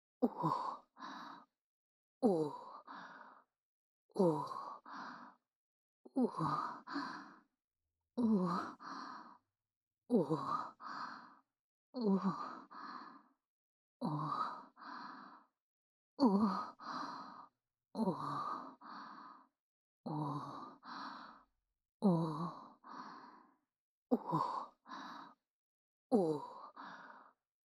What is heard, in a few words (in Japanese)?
リズムオホ声; ミオ; 双子ダウナーメイドにオホ声で支配される残酷なオナニーサポート